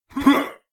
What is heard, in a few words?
Combat; Eshimal; SyrinScape; Snarl and Oraculum